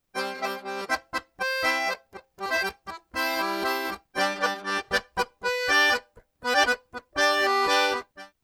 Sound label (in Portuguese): Acordeon Arrocha Simplificado